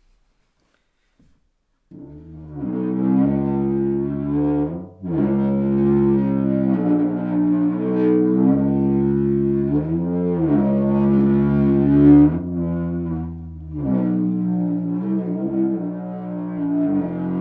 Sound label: Rubber Mallet groß große Djembe